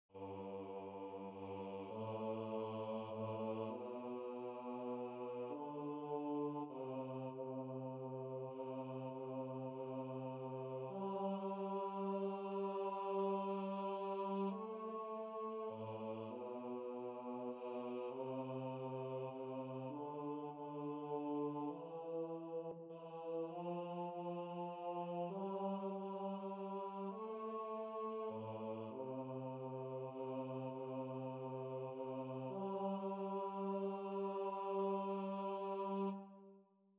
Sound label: choir